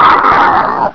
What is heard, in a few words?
death; sven; zombie